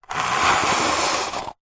table tennis portable retractable net pull open out plastic housing; zapsplat